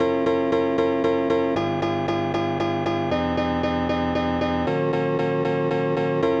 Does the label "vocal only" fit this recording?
No